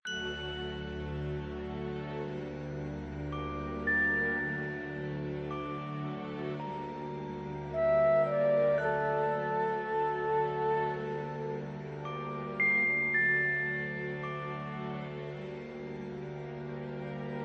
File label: Music Attic; What Never Was